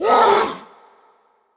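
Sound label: goblin voice die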